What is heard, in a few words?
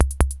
DISCO BEAT